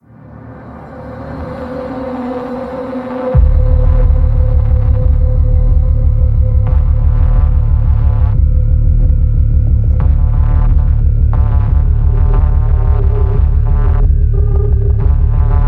Main Ambient; Blood Harvester

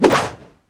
Bird Shot; angry birds rio

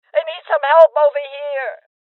Voices Injury Female Old; rebel cops 2019 gamerip

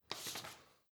UI Window Open

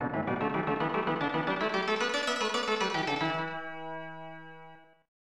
Mini-Game Win; Super Shougi 3 1995 SNES

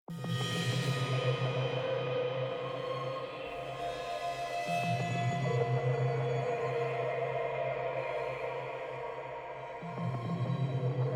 Glitch Long

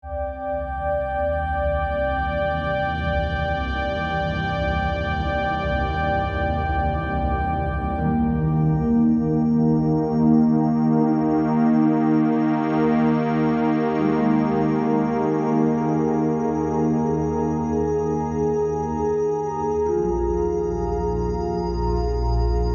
Hopeful; The Walking Dead The Telltale Series Complete

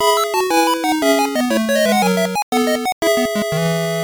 End Jingle; Asterix and the Secret Mission; master system

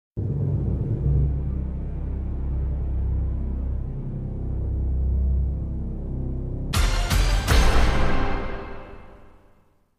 Three Beeps; Who Wants To Be A Millionaire

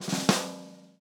Objective Completed; Rebel Cops

